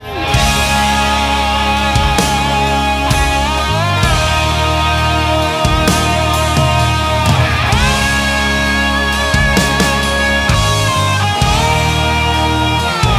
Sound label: Instrumental